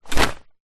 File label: foley jacket safety thick fluro hi vis drop wood floor